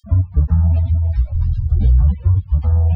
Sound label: Latino